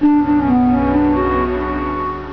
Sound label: move; El-Ixir™ 3.0.3